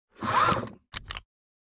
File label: seatbelt; anemoi